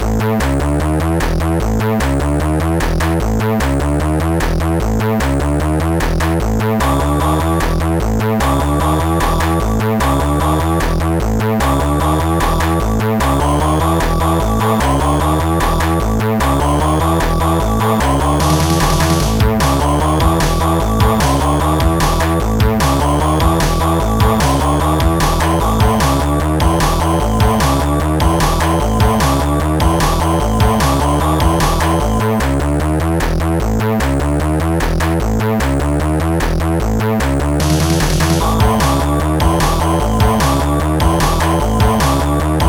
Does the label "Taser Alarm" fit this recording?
No